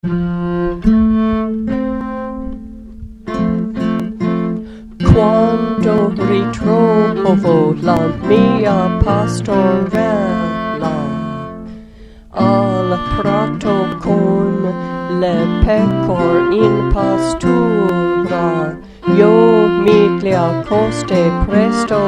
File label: Tenor Wds; Quando ritrovo